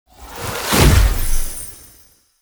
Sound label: Magik Explo Rune Cast; Powers; Runes